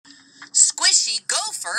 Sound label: squishy; gopher